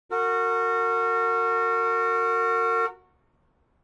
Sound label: bus; WMBonusXP